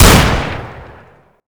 spas semi; garrysmod

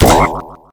frog jump; garrysmod

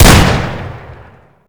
spas; garrysmod

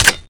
bow full draw; garrysmod

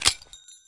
new hnade pin; garrysmod

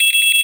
duke mine; garrysmod

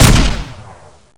nade launcher; garrysmod

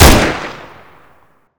rpk; garrysmod

